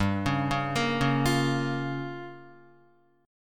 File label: G-Minor 7th Flat 5th, Guitar, Gm7b5 chord; arpeggiated; JGuitar